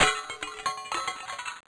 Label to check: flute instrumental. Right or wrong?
Wrong